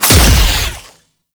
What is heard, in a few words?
Dead Zone Cast; Warframe; ManInTheWall; Slinky